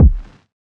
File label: kick; eddyflux; crate bd; dough-samples